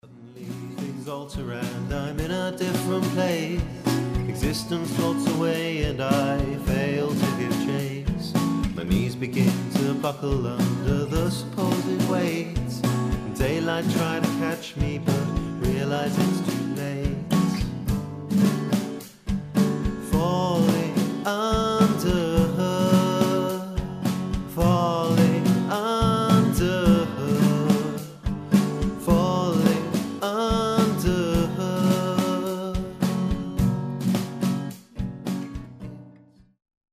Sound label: drums; visions drums